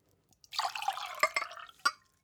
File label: glass pour clank; soundpool